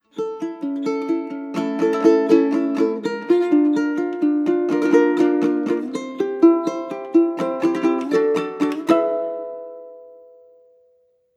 World Clear Fanfare

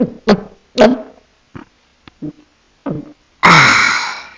Drink Milk; talking tom cat android ios mobile gamerip 2010

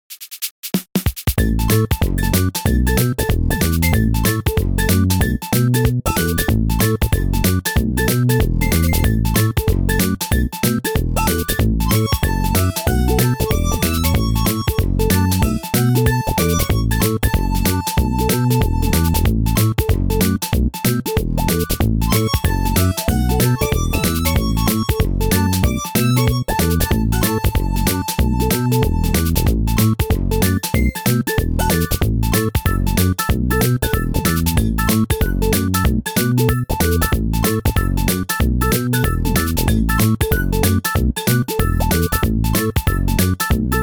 BGM; We Ski; Photo Shoot

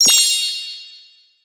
Revive; Boomerang Fu

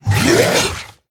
Zombie Monster Attack; Undead

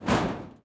Options Click

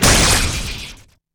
Templar Holy Rage; Inotia 3

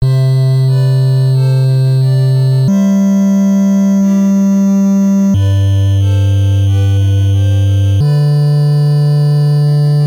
Waterfall; Advanced Dungeons & Dragons Heroes of the Lance; NES

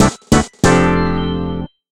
Box Legend; Smashy Road Arena android ios 2016

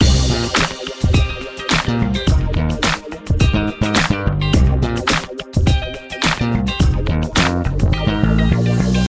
Funk; Learn to Fly 2

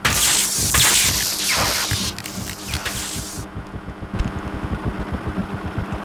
ceiling fan destroy

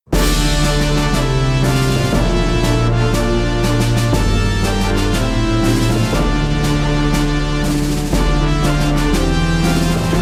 BGM

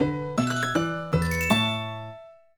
Ambient; My Make Up; nds

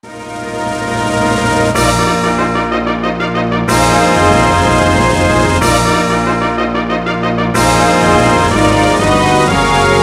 Stadium Mode Complete

